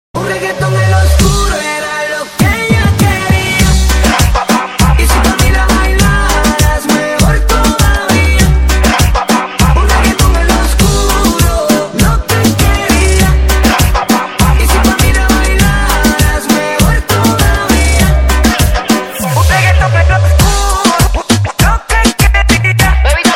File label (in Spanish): Reggaeton